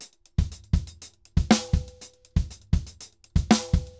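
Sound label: DRUMS L, salsa drums and percussion; Best Service ProSamples vol.14; AKAI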